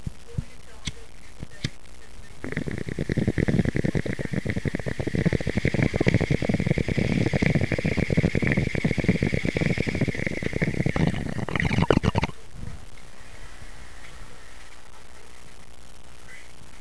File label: bubble; NoiseCollector